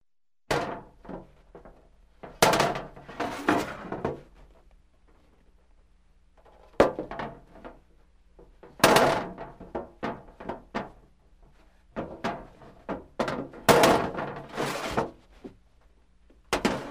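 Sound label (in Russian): кухня шум противня в плите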